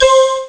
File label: Blow; Amiga Soundtracker Sample Packs